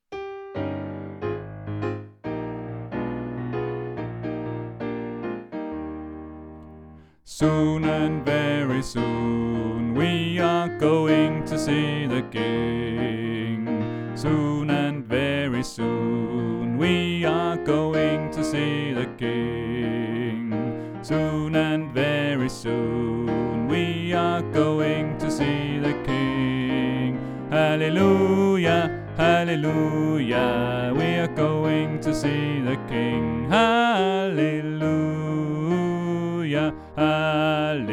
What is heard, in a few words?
Alt; oevefiler